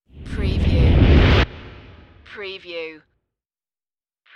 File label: Rush